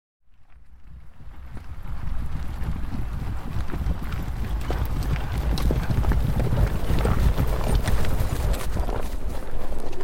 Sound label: Horses Trot Up Stop; Historical; Hollywood Edge Historical Series; War Horses Armies & Weapons